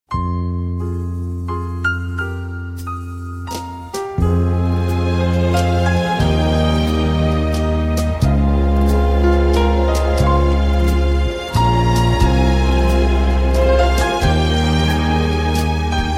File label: Waltz